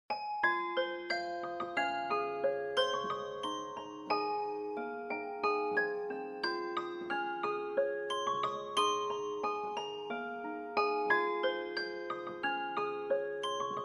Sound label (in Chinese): 钢琴铃声